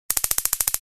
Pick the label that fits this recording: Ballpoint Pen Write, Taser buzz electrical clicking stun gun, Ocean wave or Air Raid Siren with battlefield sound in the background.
Taser buzz electrical clicking stun gun